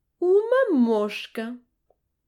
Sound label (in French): mouche